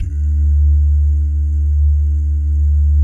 BS DOO, Vocal Planet Jazz & FX; ILIO; JAZZCOMBO

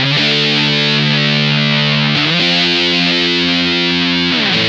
BIG CHORD, Funk Guitar; Zero G - Funk Guitar

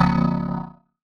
Percussive Hit, contact mic

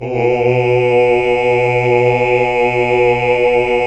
Basses

